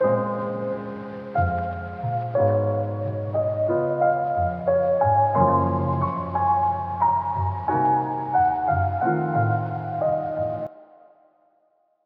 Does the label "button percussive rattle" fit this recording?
No